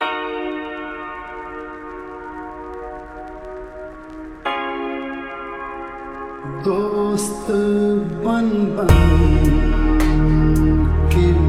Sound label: lofi flip